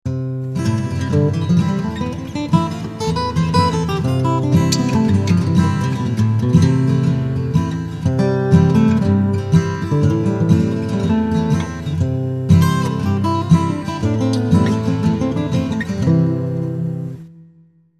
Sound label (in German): position 3, Das Beispiel benutzt alle oben gezeigten Noten an den gezeigten Griffbrettpositionen; major scales; The sample uses all of the notes shown above using the fingering shown above.